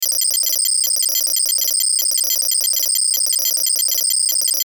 DATA STREAM STUTTER, Digital, Distortion, Swell, Static; Production Element, Imaging Element, Accent, Transition; Multimedia; Blastwave